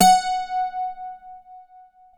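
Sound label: plucked F#4 bouzouki, Greek Bouzki; NorthStar - Global Instruments VOL-1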